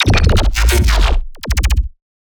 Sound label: Dirty Bass, Bass Hits; One-Shots; GlitchWorld; Ghosthack; Color Bass Essentials